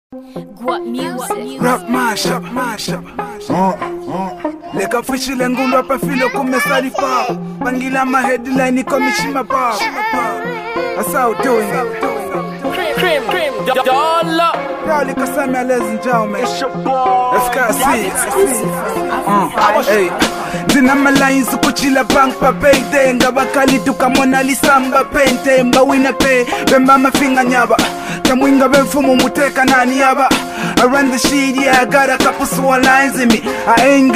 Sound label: Rap Freestyle